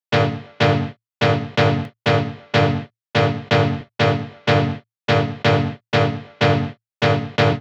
Synth Loop 124 A Retro Synth Loop

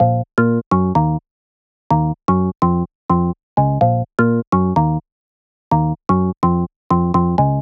126 A- house

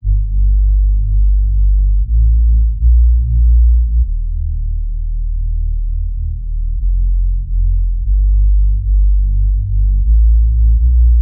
Sub Reese 120 G

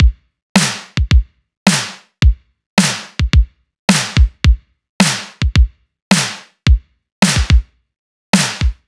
108 drum loop stripped; mixready stronger

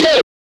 vox

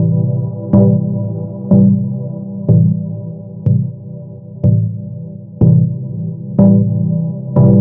music 123 Em

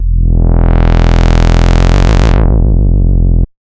E Shadow Bass

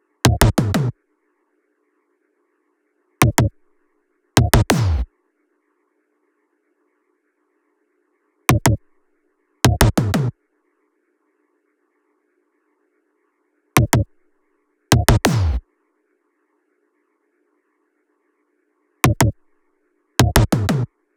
Toms Dry, 91 bpm

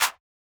Underwater Clap Sample

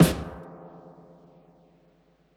Broken Snare

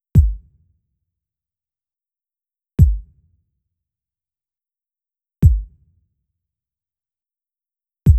91 BPM Kick; DEEP

